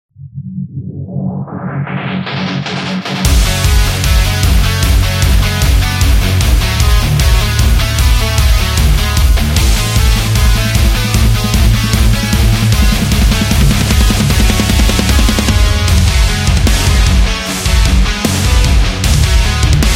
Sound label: A Heavy Metal